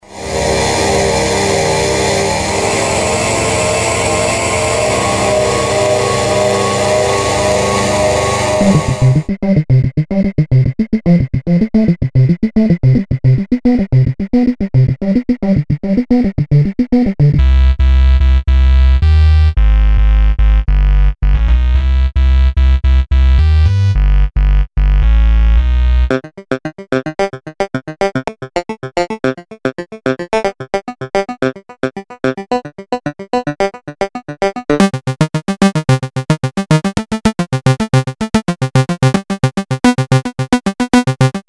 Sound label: sampler